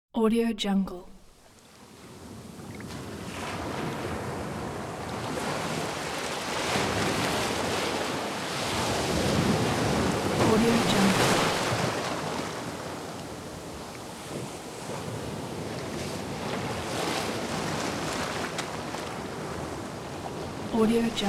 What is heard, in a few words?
sea waves